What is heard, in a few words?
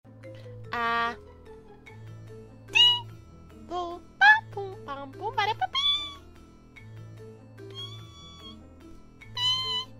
piiii